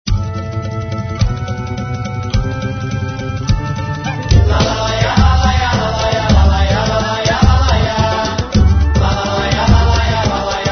batucada quente; globo

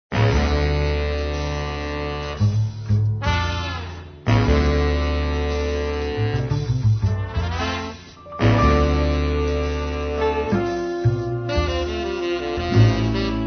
sexy

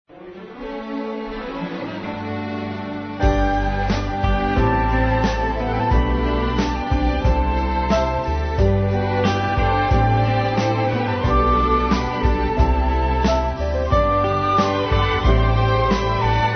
romantico

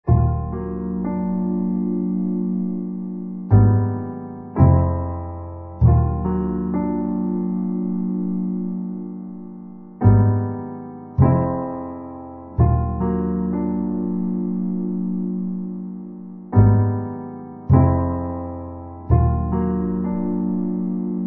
tenso atmosfera leve

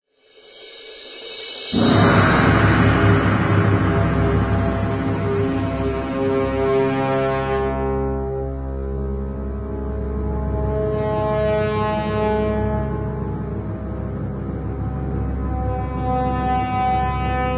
suspense trompas; globo

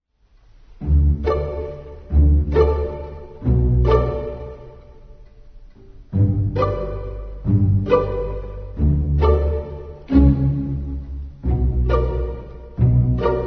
engracado suspense

